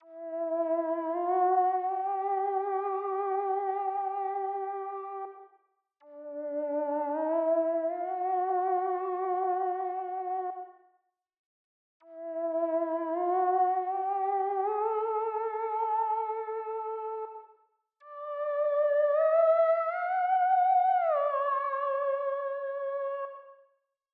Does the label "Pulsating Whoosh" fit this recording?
No